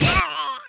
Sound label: Role Hurt; player; DungeonShooting; Godot